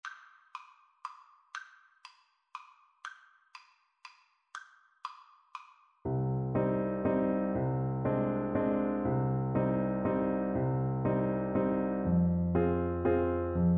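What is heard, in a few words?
grieg waltz alto sax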